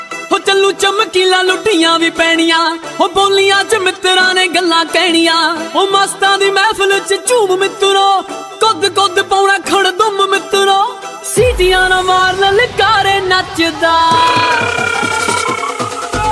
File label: New Punjabi Song Ringtone